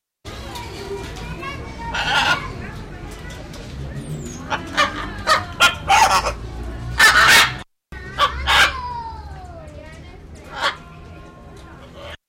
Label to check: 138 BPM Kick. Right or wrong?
Wrong